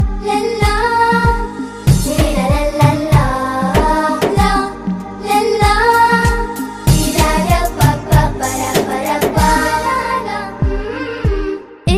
kids Arabic ringtone

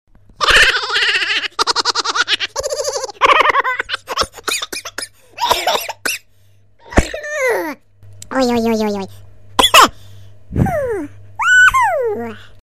HA HA HA HA HA FUNNY RINGTONE

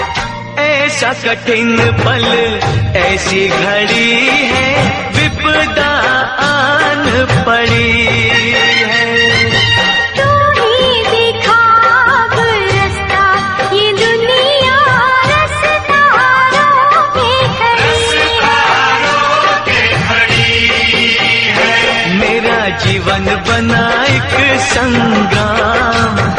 NAVRATRI HINDI RINGTONE

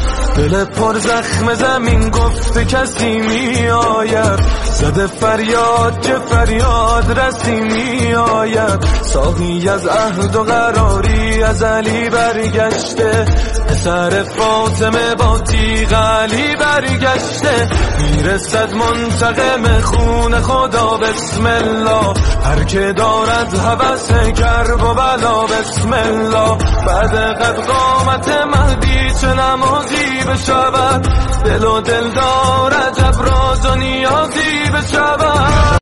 ARABIC NOHA RINGTONE

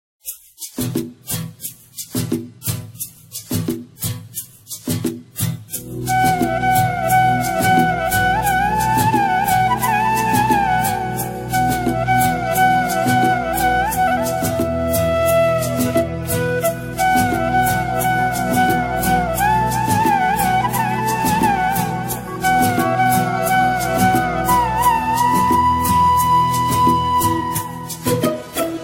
JAZZ FLUTE RINGTONE

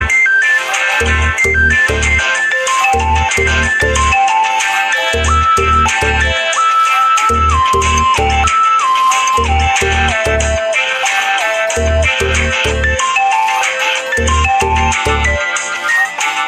VIVO U20 RINGTONE

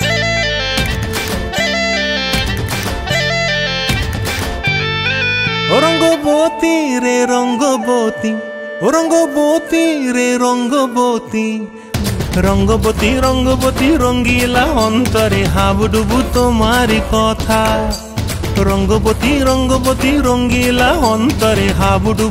Bengali ringtone

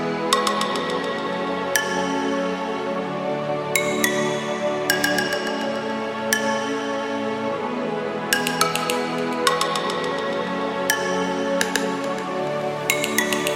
vivo v21s notification ringtone

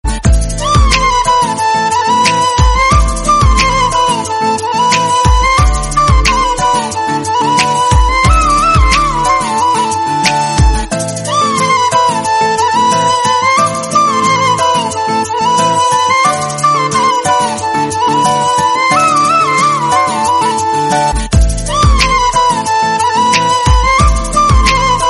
INSTRUMENTAL RINGTONE

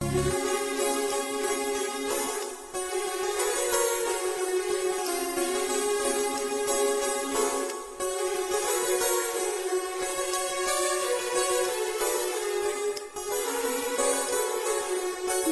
BGM MUSIC RINGTONE

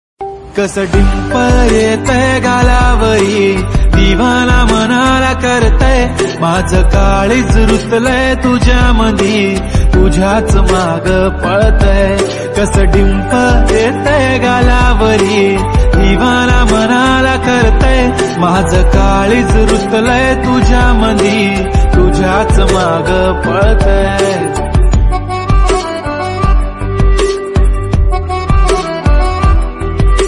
Marathi ringtone

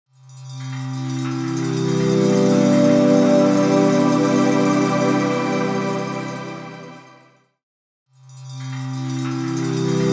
AURATONE ALARM RINGTONE

